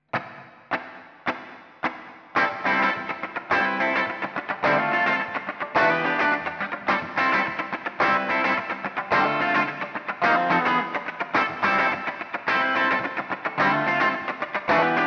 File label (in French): riff style funky